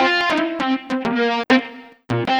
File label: Metafunk, Leads And Riffs; Sonic Foundry (Sony Creative Software); Synchro Funk